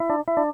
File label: vox me enable; TeamTalk v5.12 ubuntu22 client